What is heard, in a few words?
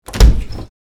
Wooden blind frame heavy open; blinds